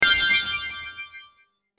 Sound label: sparkle; hyperframes